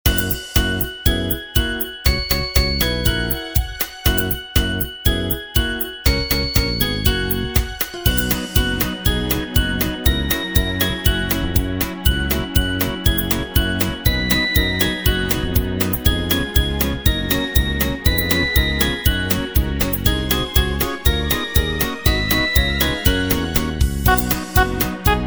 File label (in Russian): vesennaya polka